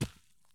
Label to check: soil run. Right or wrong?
Right